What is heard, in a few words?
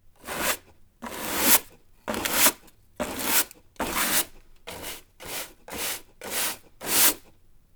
sweeping straw broom